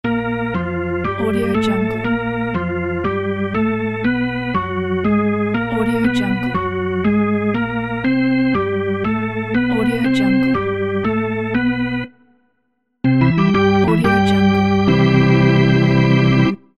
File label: baseball organ charge